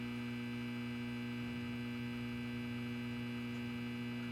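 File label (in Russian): увеличивающий громкость шум лагания телефона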